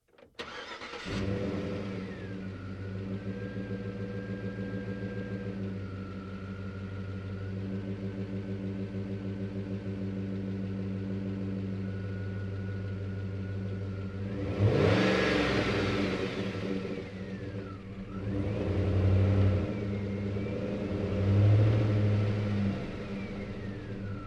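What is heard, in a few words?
Truck GMC Savana Onboard 3 Point Turns Maneuvers Slow; 2010 GMC Savana; Trucks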